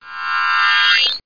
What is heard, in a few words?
door close; How Many Bugs in a Box